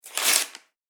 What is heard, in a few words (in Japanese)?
紙をちぎる